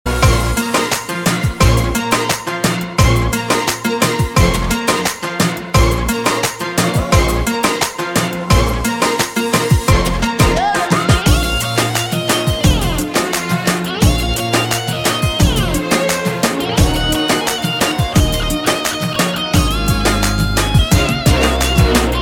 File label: arabic music